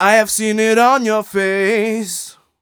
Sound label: m 127 mel a, Vocals